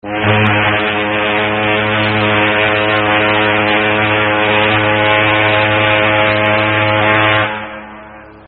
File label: foghorn